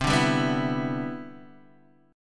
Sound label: E Minor 7th C